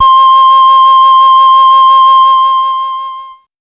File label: Casio VL1 Fantasy; Giga Samples Collection